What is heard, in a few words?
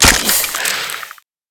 skeleton injured